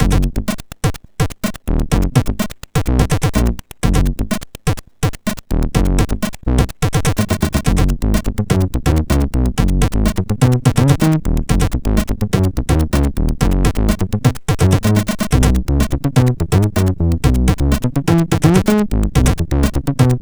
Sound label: Slapbas 2voice, sid MOS6581R4